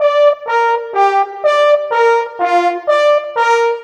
Horns, Orchestral, Rock Pop

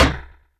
ANALOG KITS; 90 sSampleCDs; Crypton - DrumBase5000; ANALOG KITS2

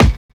kick, Drum and Bass

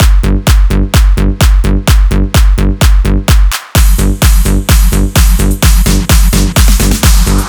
Drum Loop 128BPM Full G

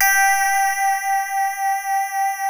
DIGITAL 1 G4; FINE ART 1

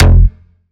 Bass F, Bass Synth; Instrument Oneshots; 99Sounds; Monosounds

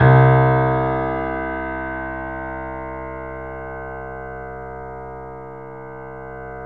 3D Pianos Yamaha C7; E-MU Producer Series Vol. 5

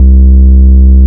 BASS R, Drum & Bass 180 BPM; Ueberschall; Drum & Bass Flash Trackz

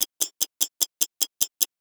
Ticking, Foley; musicradar; cartoon caper

